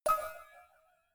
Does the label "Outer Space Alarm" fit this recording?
No